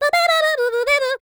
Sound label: SCAT; Spectrasonics; Vocal Planet; Jazz FX; CHEESESCAT